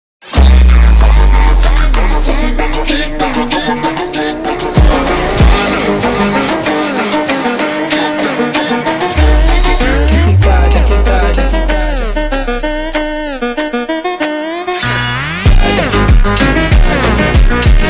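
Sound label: super Slowed, phonk music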